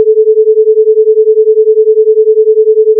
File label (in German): schwebung, akustik, ueberlagerung; physik